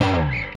Rickenbacker Bass; Airwindows; Rick-Xtras

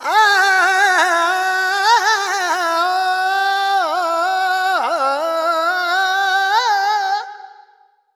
Expression, Vocals Male World Pop

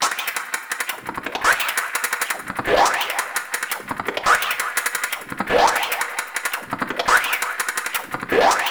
SPIT HAT, SPITTING HATS; Zero G; Malice In Wonderland

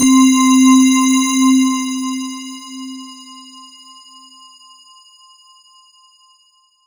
C3, Roland JV 2080, JUNGLE BRASS

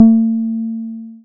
Bass SH-101

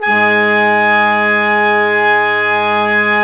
BRS QUINT, Quintet long; Roland L-CD702

